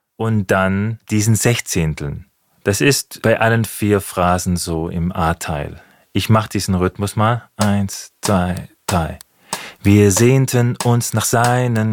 Rhythmus

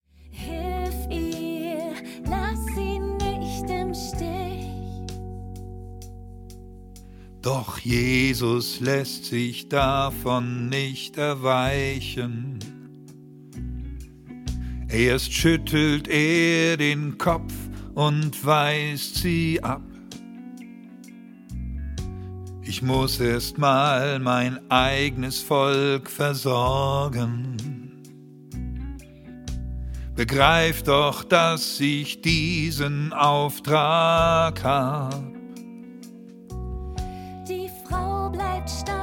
Lern-Bass und Solo

